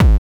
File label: BD KICK FX